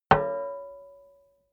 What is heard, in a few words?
plate hit ring slightly damped; hits impacts